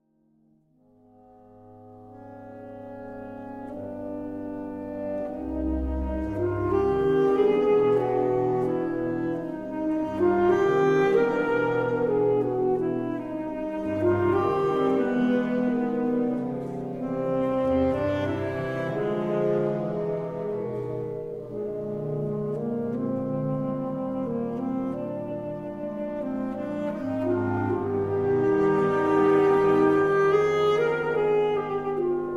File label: Choral Varie